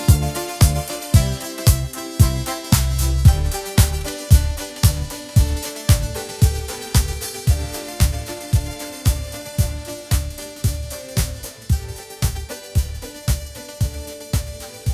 vintage phone pop groove, ringtones; ai-generated

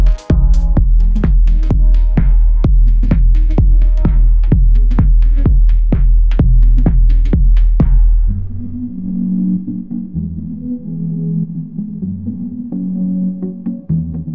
chill bass drop groove

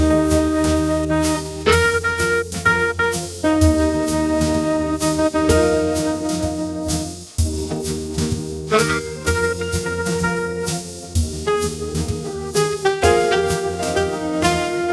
smooth jazz

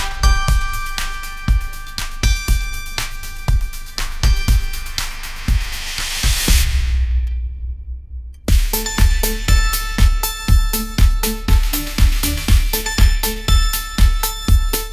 epic pop symphony, ringtones; ai

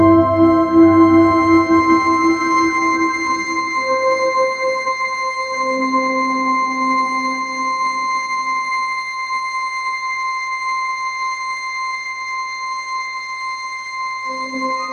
dreamy ethereal ringtone; ai

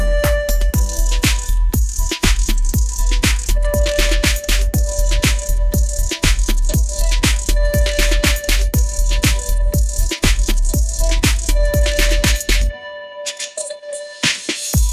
call receive pop anthem, ringtones; ai

